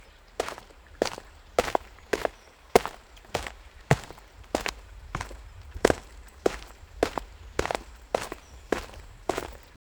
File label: realistic isolated footst, hurried